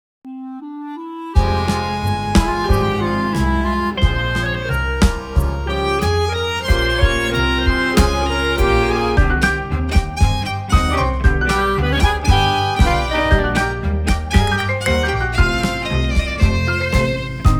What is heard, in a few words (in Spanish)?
Tango de Amor